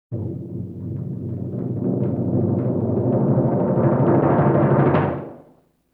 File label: LoFi Timpani Roll; Vintage Cartoon; Drums & Percussion